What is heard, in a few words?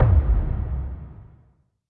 boom; SoundsCrate